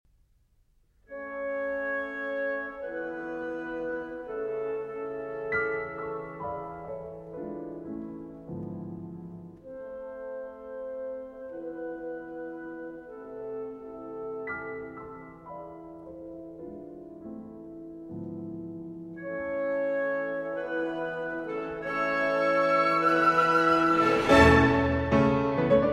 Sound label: Piano Concerto in A Minor; Op. 54; Schumann; 3. Allegro vivace